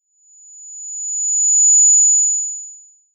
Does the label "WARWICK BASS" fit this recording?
No